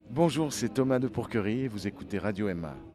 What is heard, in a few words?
jingle